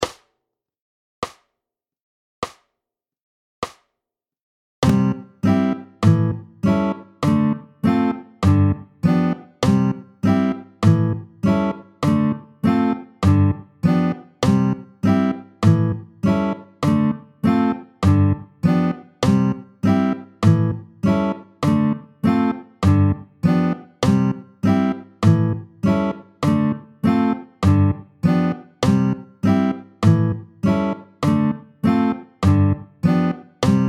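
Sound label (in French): tempo 50, L'anatole, tempo 50, guitare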